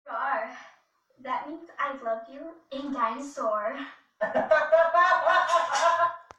Rawr

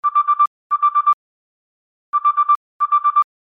Pulse Ring Standard

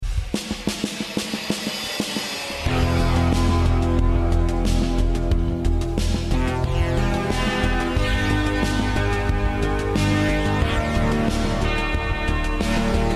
VAPORWAVE; Vice City

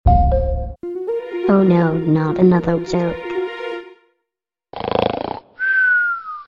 Snoring Text Alert

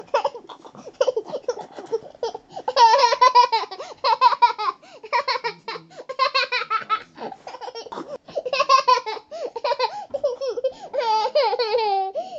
21 mth laughing

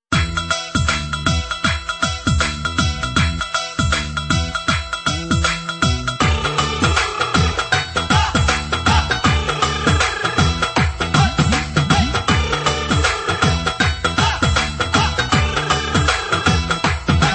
Punjabi Ring

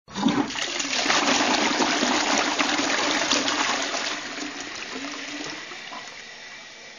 Flushing Toilet

